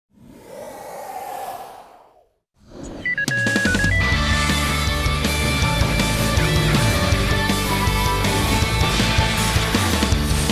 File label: Instrumental; Totally Spies Season 5